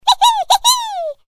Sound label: small cartoon character cheer; мультяшный смешной смех различных персонажей